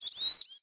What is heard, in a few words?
goldfinch; World of Tanks Blitz; hangar blitz